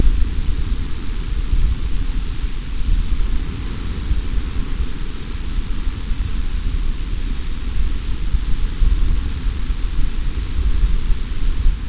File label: wind